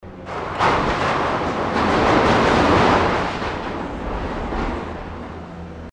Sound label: demolition crane operating